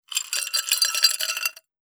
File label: filling a glass with ice